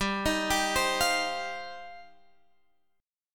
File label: G 7th Suspended 4th Sharp 5th G, Guitar Open G; x,12,13,12,13,15; down